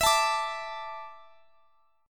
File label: E 7th Suspended 4th E down Guitar Standard; strummed; chord; E7sus4 chord diagram; JGuitar